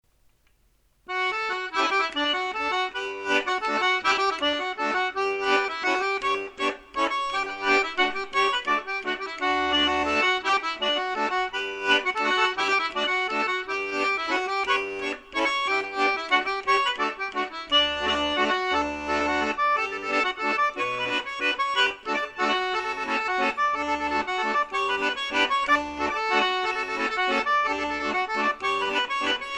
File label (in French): valse quebecoise, musique diatonique